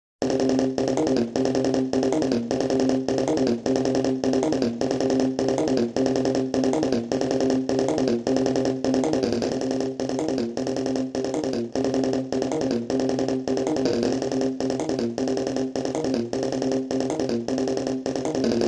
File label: Rhythm